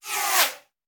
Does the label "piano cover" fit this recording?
No